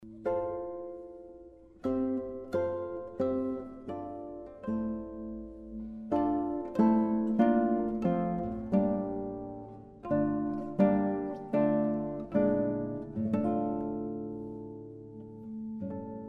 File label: guitar duo; Cordoba